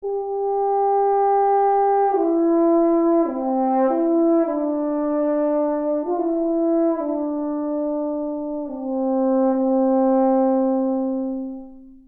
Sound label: horn fm